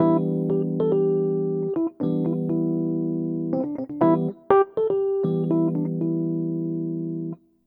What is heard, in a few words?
Chorus A, jazz; джазовый; keys